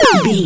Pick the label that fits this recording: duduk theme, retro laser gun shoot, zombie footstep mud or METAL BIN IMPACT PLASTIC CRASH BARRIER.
retro laser gun shoot